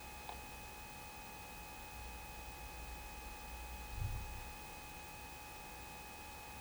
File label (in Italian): tonfo sordo anche in altre parti del tracciato; Rocca Pontedellatorre Este